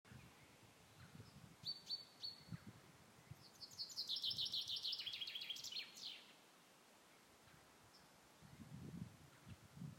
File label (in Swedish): kvitter